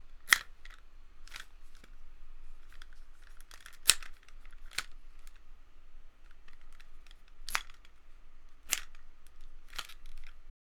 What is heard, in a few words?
Sound Of Headphones Being Closed And Folded